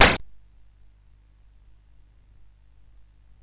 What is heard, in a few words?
sid CSG8580R5, GAMES; Dragons of Flame